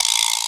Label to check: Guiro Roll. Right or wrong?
Right